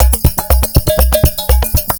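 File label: 120 COMBO, drum; Best Service Advanced Media Trax Modern Composer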